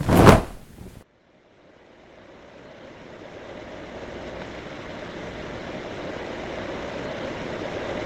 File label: airdrop fall